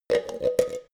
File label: plastic handle